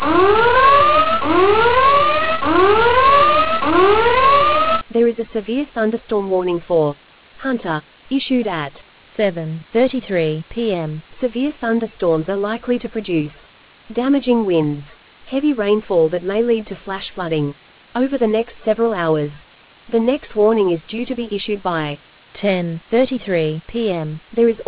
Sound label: storm audio